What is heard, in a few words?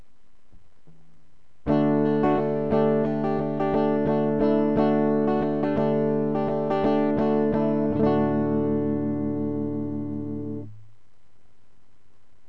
normal electric